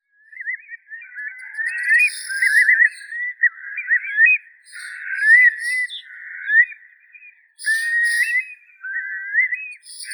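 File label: slender-billed curlew, Numenius tenuirostris; kronshnep malii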